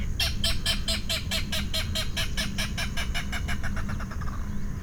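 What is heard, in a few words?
Clapper rail khurrah; National Marsh Bird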